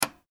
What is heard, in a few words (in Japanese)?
鍵 を かける